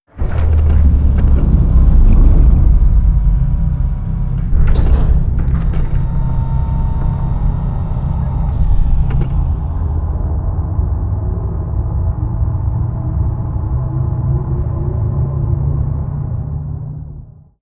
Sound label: plantoid colossus closing; apocalypse